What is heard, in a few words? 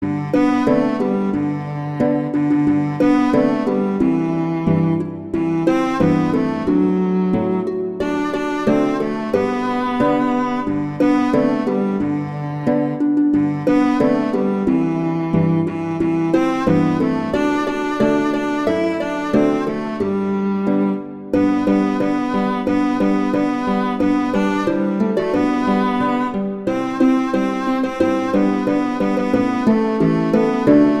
Jingle Bells, Christmas